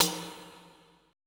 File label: E TAMB, ETH PERC